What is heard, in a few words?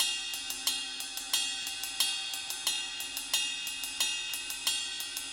Ride Riff, cymbals; Sonic Foundry (Sony Creative Software); Joe Vitale Latin Percussion